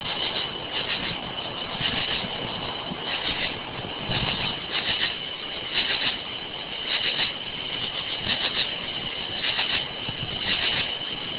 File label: nite bugs